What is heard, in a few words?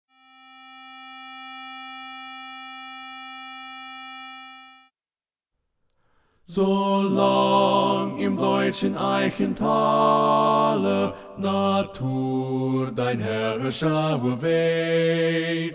Baritone Left; Junglingswonne; German